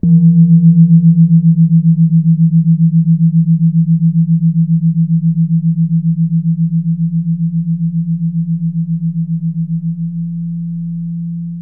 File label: jegogan, gamelan; gravityharps